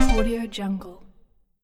game level up

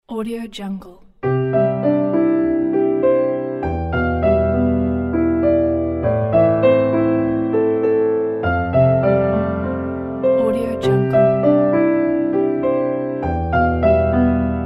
sentimental piano

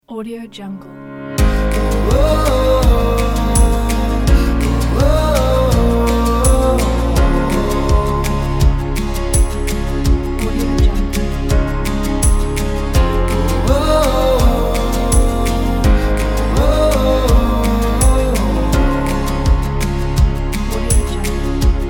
indie folk

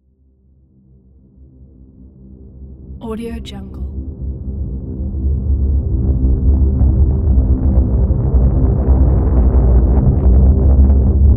deep dark tension drone

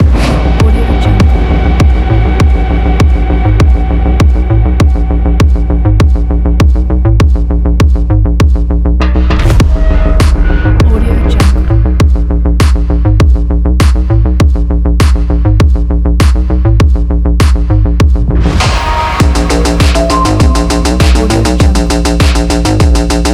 cyberpunk furious

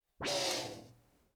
pneumatic squish open close forward reverse

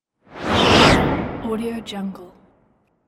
web transition

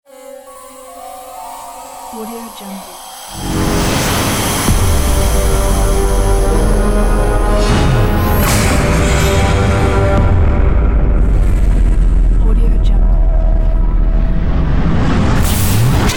horror epic trailer logo; volkovsound

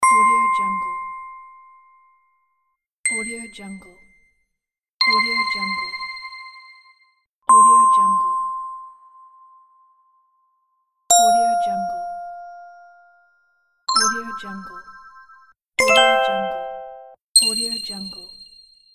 idea ding pack

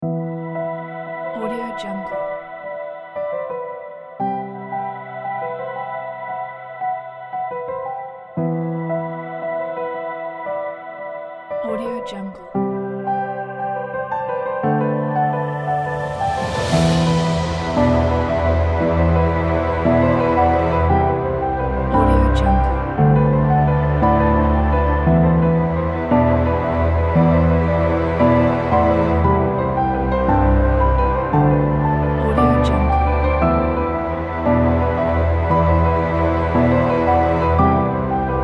atmospheric piano soundscape

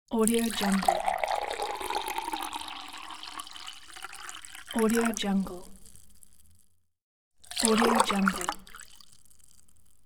glass water pour; sviridpavlov

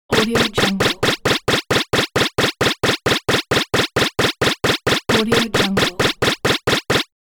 constant timer

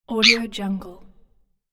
child short scream in pain

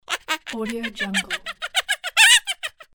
cartoon witch cackle; fxprosound

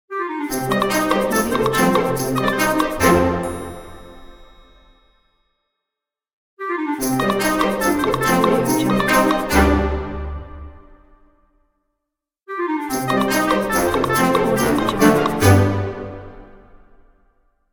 kids comedy logo

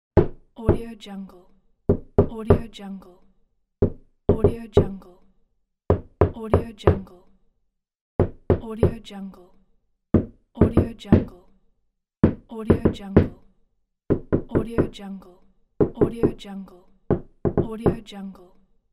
knocking on door

